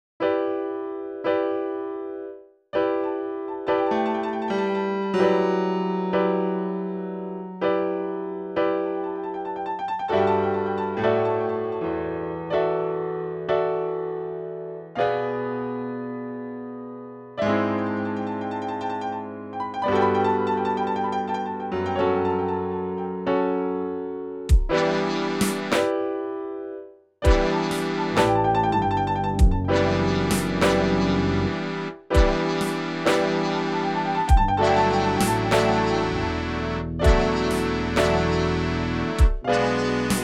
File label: Main Menu Music; The Captives Plot of the Demiurge